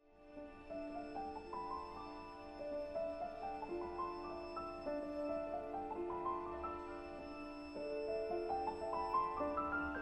Piano Concerto; Malcys

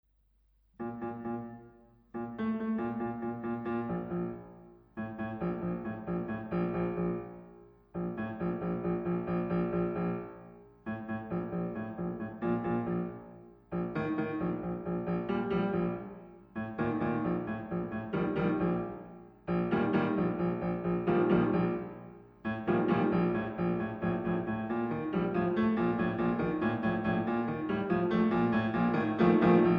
Sonata for violin and piano

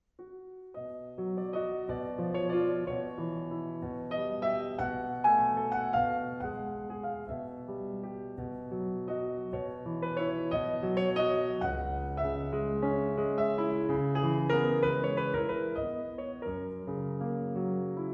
Nocturne op. 9 nr. 3 H-dur

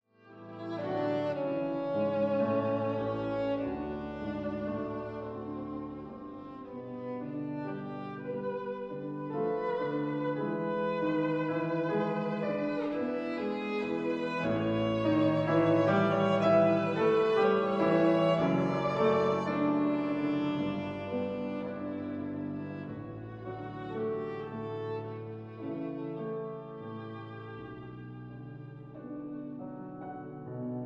Piano Quintet; Gernsheim

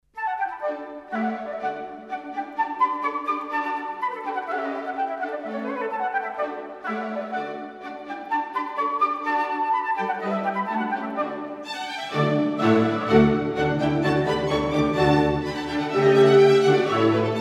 Flute Concerto No. 2; Mozart

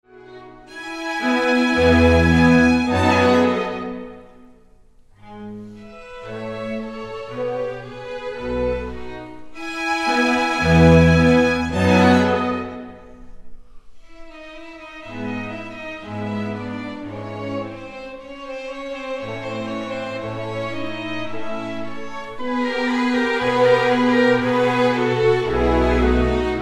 Concerto in A for Violin and Orchestra; Henkel; Concerto No. 5

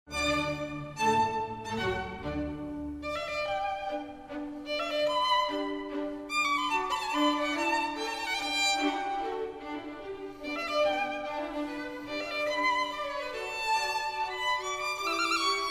Violin Concerto; Mozart

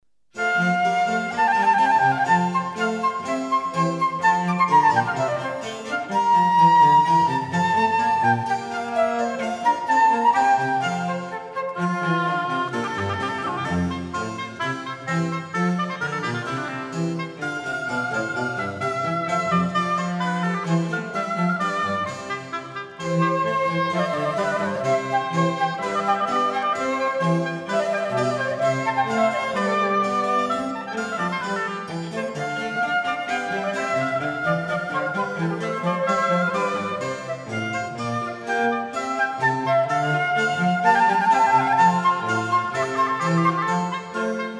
Trio sonata F; Handel

